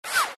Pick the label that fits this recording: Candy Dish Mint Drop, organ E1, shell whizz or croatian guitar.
shell whizz